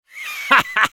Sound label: laugh short, fr